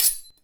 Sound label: General Button; netent